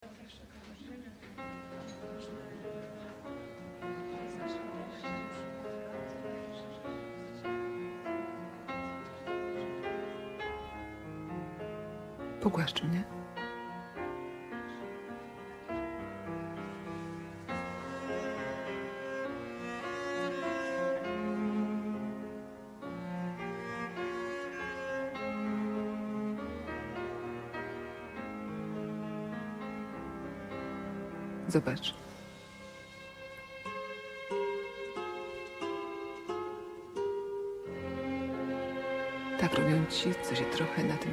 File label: coffe shop sound track